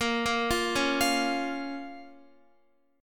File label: A sharp Minor A sharp down Guitar; A#m; arpeggiated